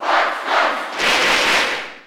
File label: Cheer German; Fox; SSB4